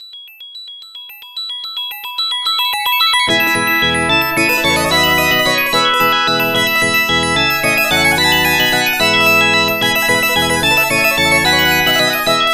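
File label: background music; Mega Twins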